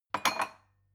glass shelve soft

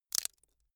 bamboo small break hard

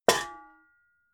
pots hit hard

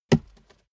step barefoot metal single trample right

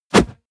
push

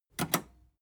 metal button robust click fast

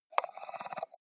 computer mouse wheel scroll slow

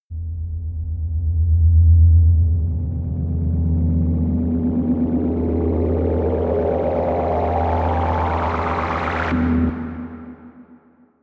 rise hum whir deep

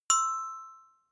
notification glockenspiel third

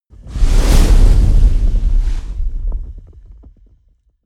whoosh fire ignite large

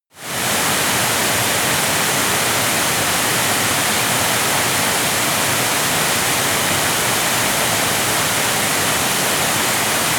waterfall medium

